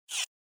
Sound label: navbar close